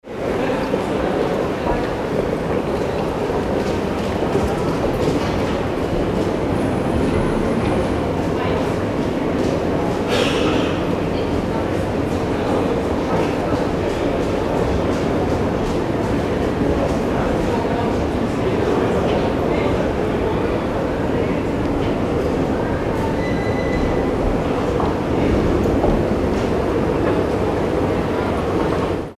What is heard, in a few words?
railway station hannover